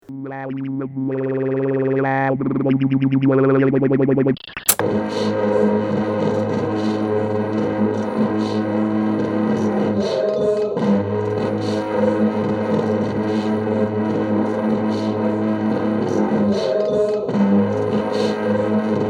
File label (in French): surtout des basses